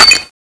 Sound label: crystal pickup; cstrike